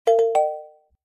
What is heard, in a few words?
success